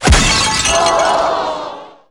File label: SE GAME BREAK; maimai